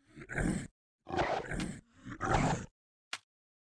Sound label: axe idle, monster